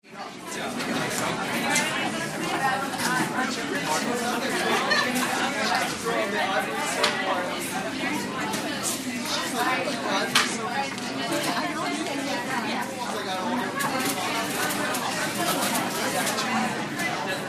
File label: RESTAURANT Background CAFETERIA: College cafeteria, busy & cheerful.; restaurants & cafes